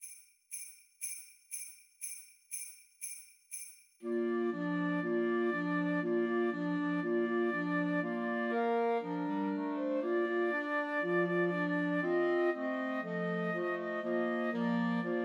Jingle Bells Christmas Trios for Flute Clarinet and Alto Saxophone